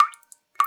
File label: Drips